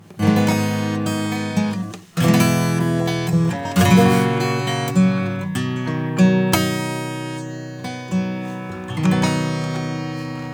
Acoustic Guitar; Neutron 4; Tone 50

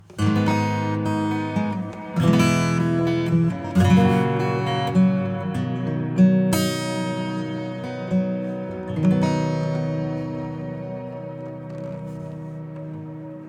Delay acoustic; Cluster; preset MOONLIGHT

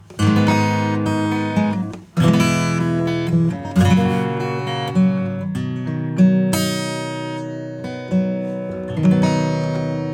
acoustic Tube Overdrive; Analog Pro

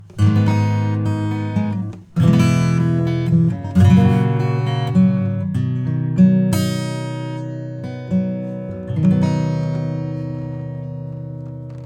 acoustic bypass; Joe Chiccarelli

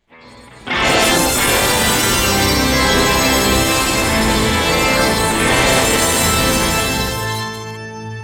Guitar Shimmer

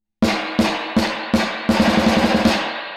Spring damp low 0

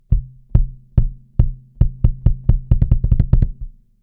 bass drum bypass

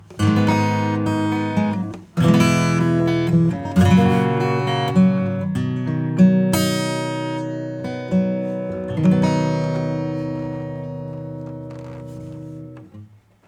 acoustic Comp

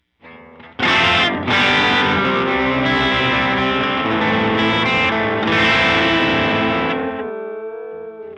Moogerfooger MF-104S LFO sine

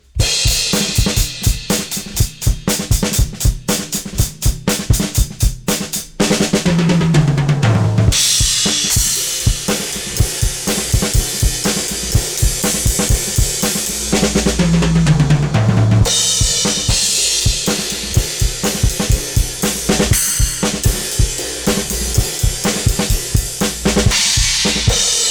drums Funk; Joe Chiccarelli

